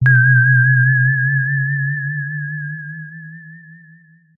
synth theremin robot buzz

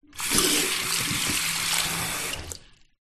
household tap on run off short basin bathroom

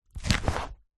household book large heavy open

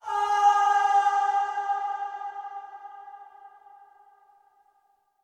Transition Vocal Flashback; bottlerocket

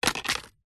leaf dry fall onto concrete

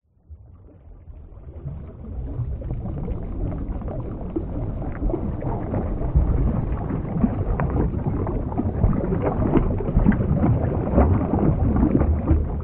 water underwater movement; nature

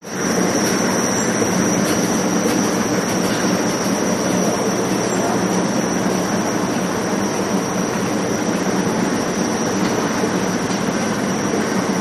industrial escalator ride; zapsplat

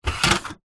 office printer paper tray plastic push in

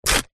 foley paper glossy rip

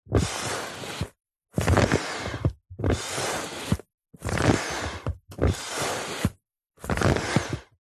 household pump foot close up pumping slow

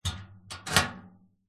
metal box latch open close

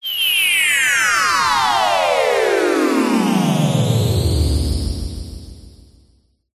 science fiction teleport classic vintage

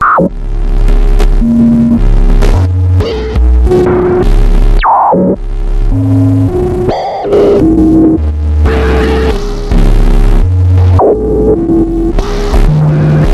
cyberpunk interference grotesque; gregor quendel

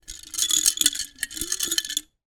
sweets candy in glass jar shakes; zapsplat

